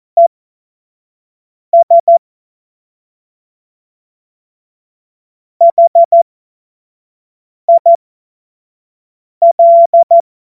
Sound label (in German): Witz, cw morsen; Tempo 25 F70